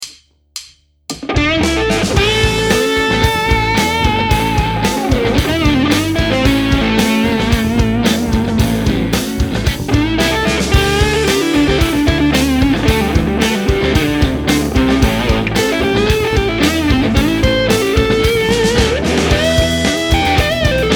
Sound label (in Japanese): 16ビートブルース譜例